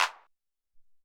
Tek Clap, drums; Alesis SR-18

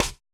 snare, Roland MC-909

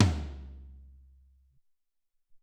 Amb Tom L, Alesis SR-18, drums

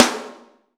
Ensoniq ZR-76 LUDWIG SN

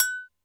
Hi Agogo, Alesis D4; drums

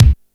kick, Yamaha RY-8

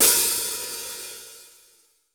Boss DR880 hihat; drums

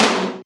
Alesis HR16B Aggressive Tom; drums

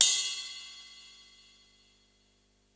ride, Yamaha RY-8 drums

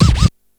scratch, Yamaha RY-8

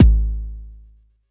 Noisy bd, Jomox Airbase99

